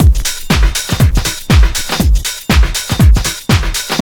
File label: house loop